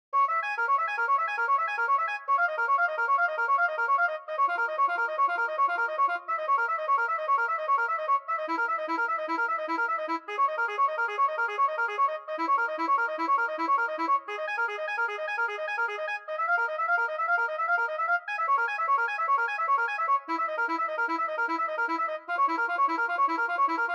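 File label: Key Bb Blues Mode1 Soprano Sax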